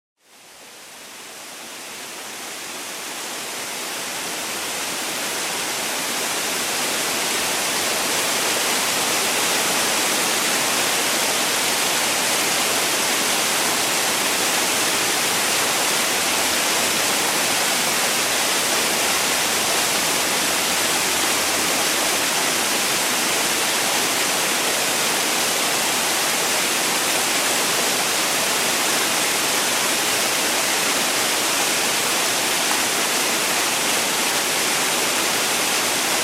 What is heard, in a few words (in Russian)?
zvuki akvaparka